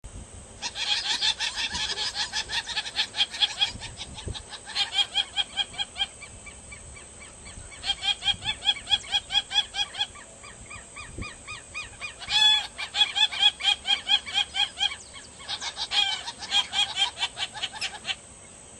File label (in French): Jacana noir